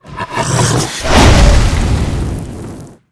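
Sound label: Dragonneut skill; Seer; GamigoNA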